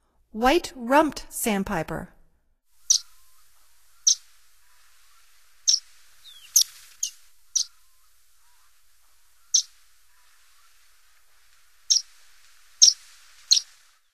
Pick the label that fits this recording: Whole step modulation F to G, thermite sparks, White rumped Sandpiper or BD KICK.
White rumped Sandpiper